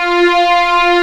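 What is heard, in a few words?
F4 legato, violins; East West; The Ultimate String Collection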